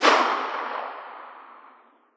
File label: fiveseven distant, weapons; Counter Strike Model Pack; CS2